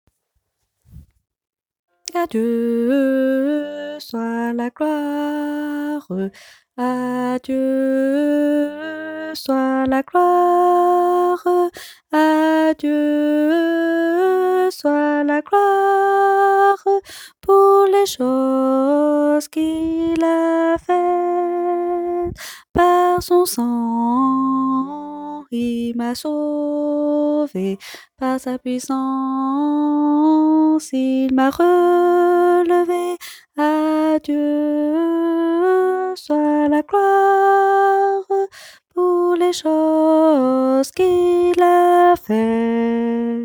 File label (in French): basse; 162 – A Dieu soit la gloire !